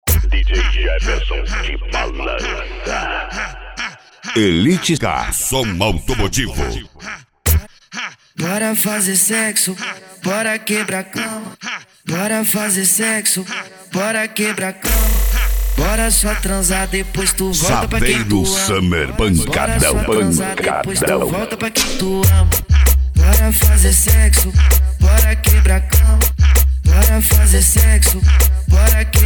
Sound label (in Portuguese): som automotivo; elite car